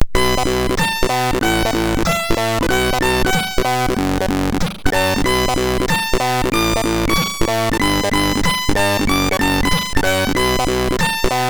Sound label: Visages Editor, MOS6581R3; JCH in Visages Editor